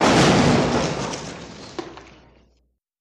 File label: Metal Crash; crashes